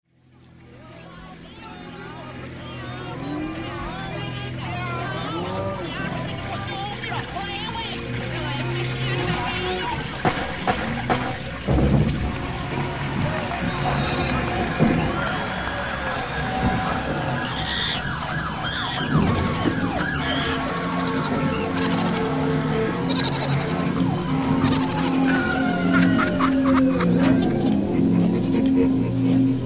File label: noise